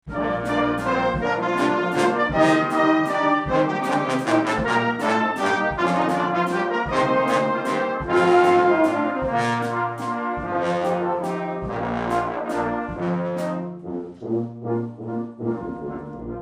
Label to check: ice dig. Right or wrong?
Wrong